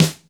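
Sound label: snare drum neutral; SNAREDRUMS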